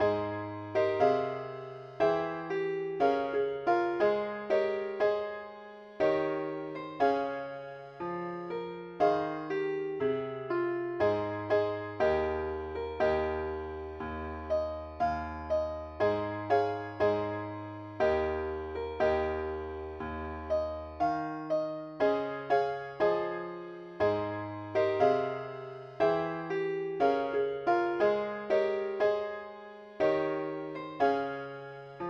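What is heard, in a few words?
ukelele key a flat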